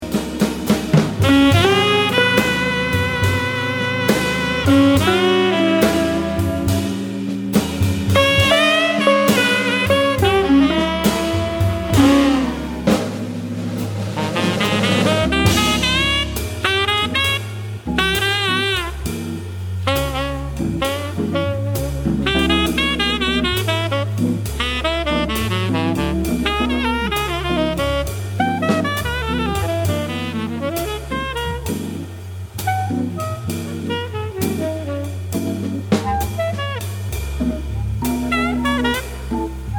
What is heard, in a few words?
Aztec Blues